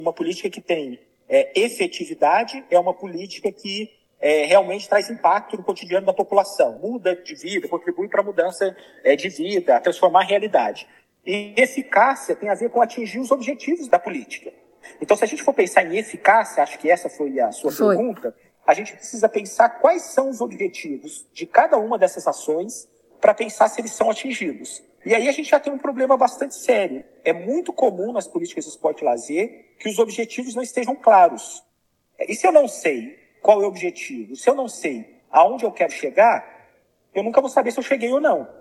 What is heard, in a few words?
Vocals